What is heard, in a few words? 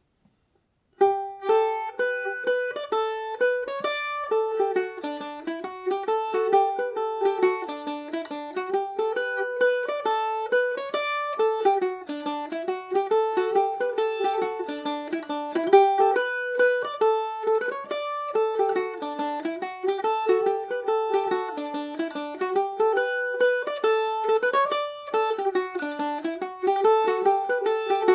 dmaj revisited, mandolin; Falder mandolin